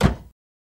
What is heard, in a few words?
Cabinet Door Close